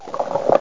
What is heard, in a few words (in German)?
sumpf; tilemove2